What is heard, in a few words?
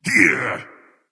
grunt attack; smite; voicepack